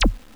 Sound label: missile